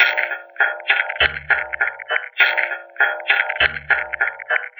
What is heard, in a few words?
Scratchy Tinny Drum; Electronica; Solo Beats